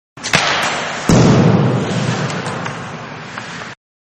Ice Hockey Slap shot and miss, Up Close; Sports; Hockey Ice-Slap Shot And Miss-Up Close; Manhattan Production Music

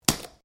Branches Dead Snap; Material components for constructing more complex sounds; Snaps (Sound Effects:Objects)

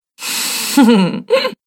Woman Laughter

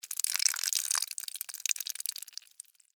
Blood Squirt Heavy, Spill, Drip; Horror; Blood And Guts Sound Effects; Blast Wave

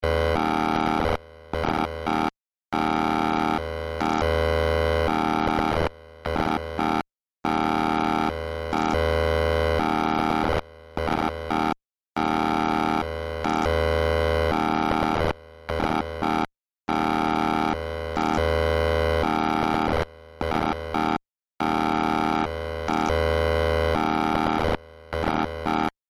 Science Fiction Computer Readout Damaged; Computers; SFX Bible

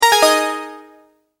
multimedia tone end

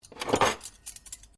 Cutlery Item Multiple Pick Up, household cutlery; Kitchen

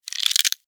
Insect, Movement, Crunch, Bug; Animals:Insects; Blastwave

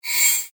Dragging Thin Metal; Dragging Sound Effects; Andrew Fleming

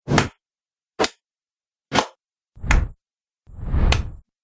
Punches With Swoosh; Punch And Hit Sound Effects

